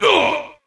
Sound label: Wound Heavy; Creature; Varimathras